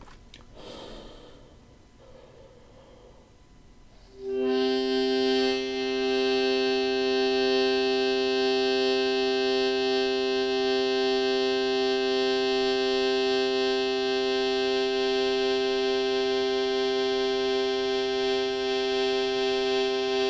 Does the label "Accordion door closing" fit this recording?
No